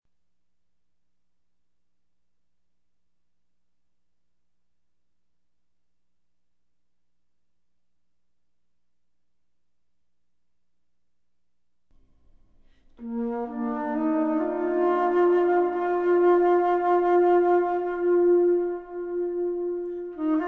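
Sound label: melody; Myst III Exile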